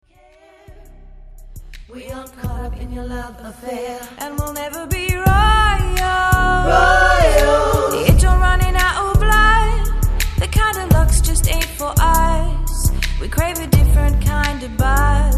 Bassline

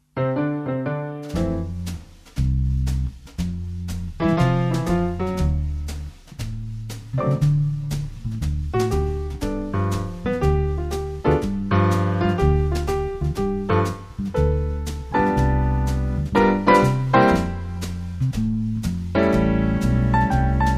Instrumental

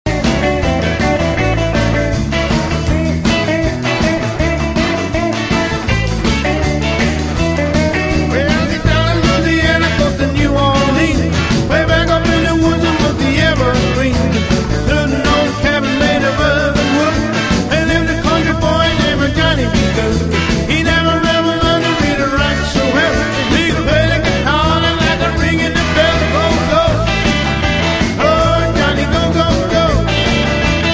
Guitar

